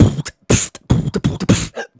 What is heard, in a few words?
Beatbox Loops, Human Beatbox